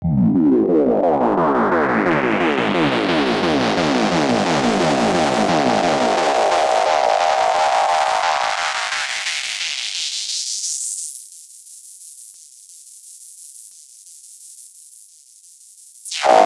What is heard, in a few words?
Thumper; AMG - Prototype Drum & Bass